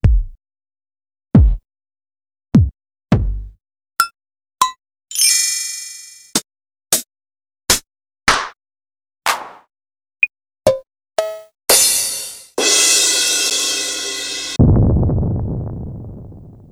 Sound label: Roland D-2 Roland D2 Kit